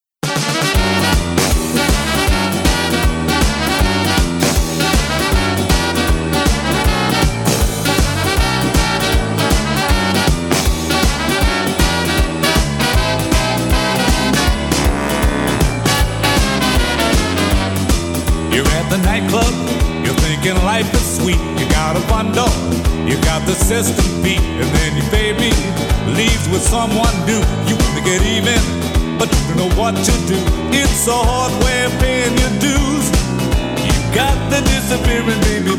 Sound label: Blues